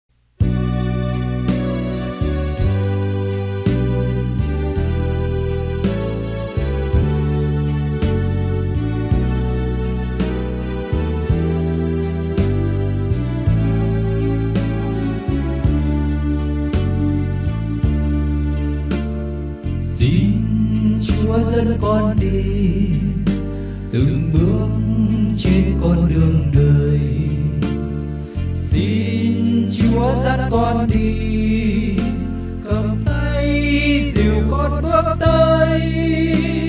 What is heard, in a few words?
bass